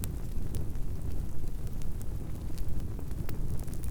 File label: Fire